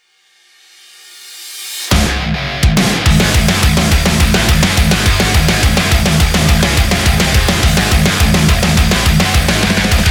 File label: soaker modern metal